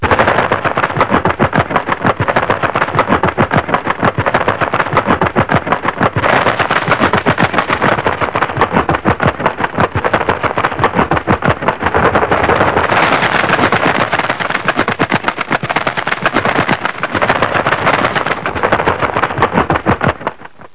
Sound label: Wind; 特酷声音素材库; 自然; 风声